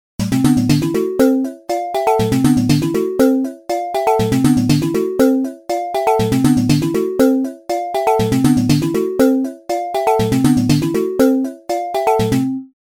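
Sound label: Hard Hits Cycling, Chromatic hits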